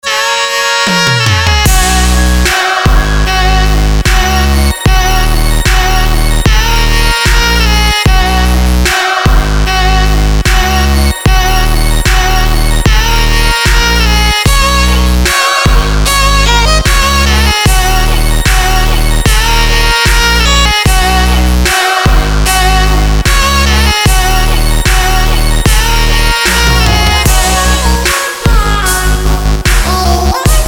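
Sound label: saxophone trap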